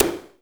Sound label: noise fx; Masterbits; Switch FX